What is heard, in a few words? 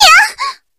combo break